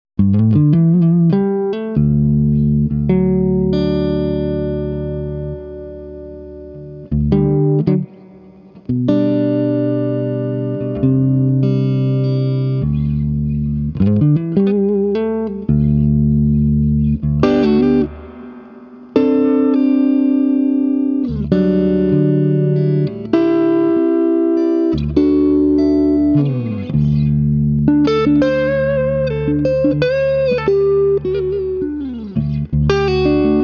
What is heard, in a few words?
Swart Amp